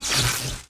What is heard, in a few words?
Message Sent; Stargate